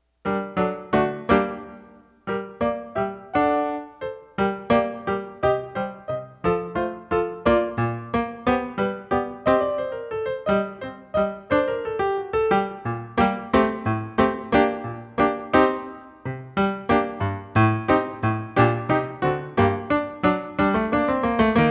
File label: Accomp Only; Solo Accompaniments; Alto Sax; Sonata Mvt 4; Eccles